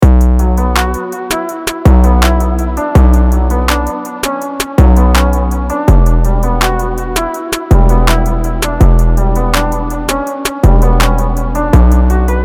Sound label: Beat